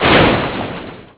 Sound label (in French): armoire